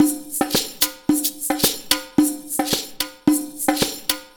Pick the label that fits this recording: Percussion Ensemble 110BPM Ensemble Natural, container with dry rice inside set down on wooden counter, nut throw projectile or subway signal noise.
Percussion Ensemble 110BPM Ensemble Natural